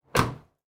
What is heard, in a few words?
Car Door Close